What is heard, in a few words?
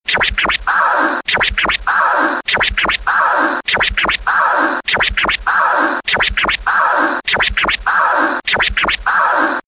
Skratch Aaahh